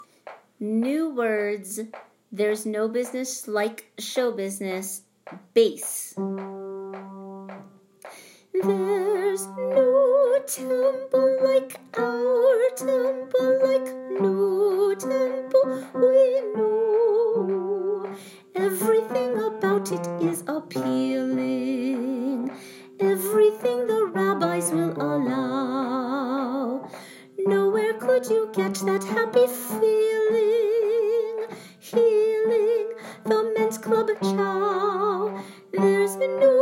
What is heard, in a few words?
Bass; Broadway Shabbat